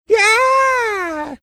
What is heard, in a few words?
making scream; burbhelm